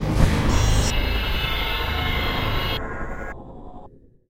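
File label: sounds of endings outro, disappearance fading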